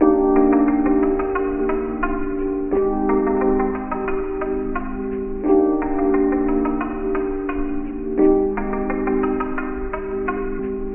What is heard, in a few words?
keys and guitar; loops; Phonky